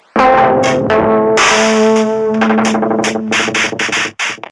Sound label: melody; GONNER